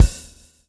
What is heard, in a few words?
SWNG